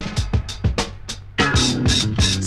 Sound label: RARE FILL 97, drum; BREAKS